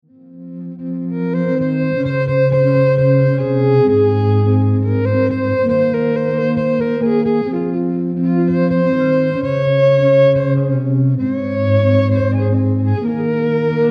Electric Violin

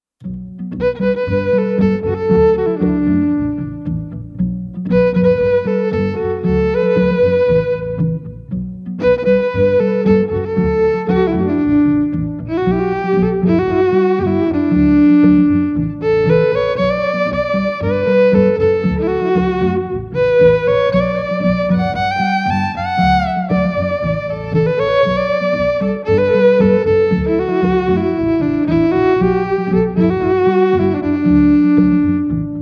Solo Electric Violin

